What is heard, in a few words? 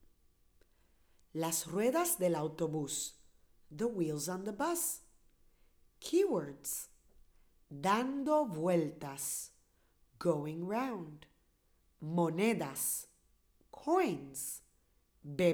The Wheels On The Bus Spanish; Bilingual Birdies